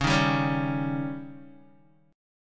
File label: C sharp Suspended 2nd Flat 5th, Guitar; strummed; chordshape; 8,6,7,6,7,x; JGuitar